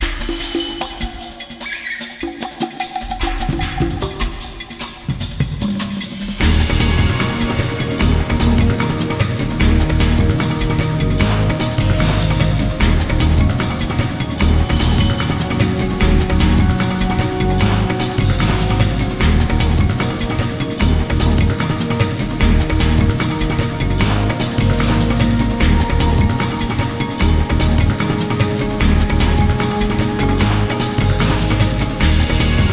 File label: Rainforest; Soccer Slam; GC, PS2, Xbox